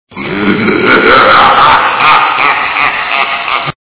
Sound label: laugh